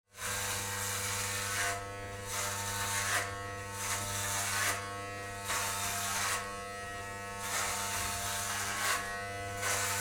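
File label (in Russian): звуки машинки для стрижки волос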